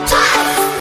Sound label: Yell